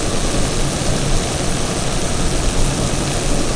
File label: Wet Tarmac Internal; RallyXtreme; Surfaces